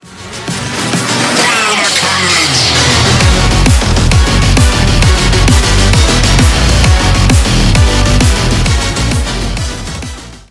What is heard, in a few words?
rum n bass